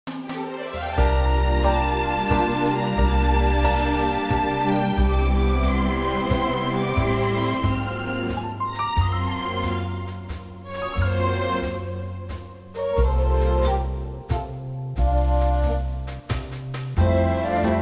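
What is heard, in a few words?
F orchestra; key - F - vocal range - C to D; modern waltz; gorgeous orchestral arrangement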